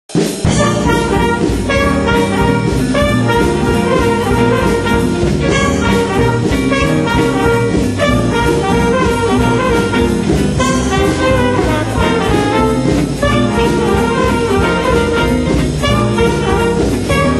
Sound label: tenor